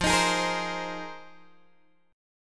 F Minor 11th, Guitar Open G

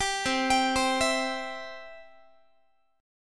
C Major, Guitar; arpeggiated; 22,20,22,22,20,x

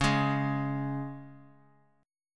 C sharp 5th; strummed; C#5; x,x,11,13; JGuitar

